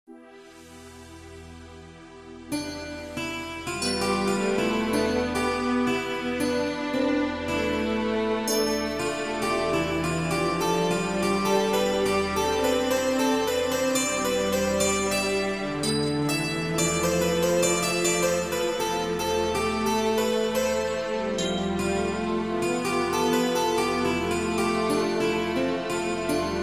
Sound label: Instrumental; bangla